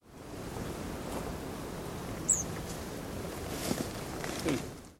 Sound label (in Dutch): Siberische Boompieper; Nolledijk Vlissingen NL 13okt2014 Thomas Luiten